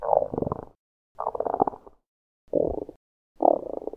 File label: Real Belly Burps